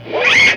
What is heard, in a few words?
GTR, E.Guitar FX; Roland LCDP02 Guitar and Bass